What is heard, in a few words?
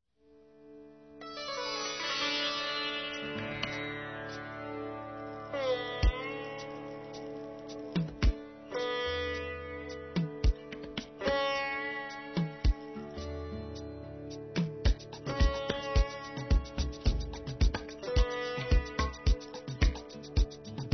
Fusion Music Raga; RKC Live Stream; Travel India